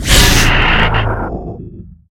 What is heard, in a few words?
Pickups Powerups